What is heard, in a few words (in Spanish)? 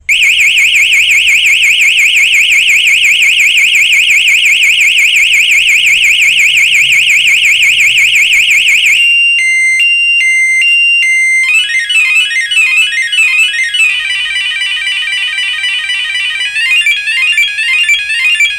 disparo; alarmas